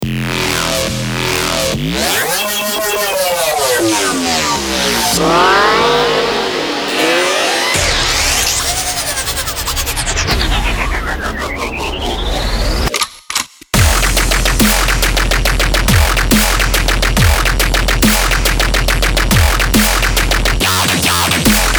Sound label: Dubstep Demolition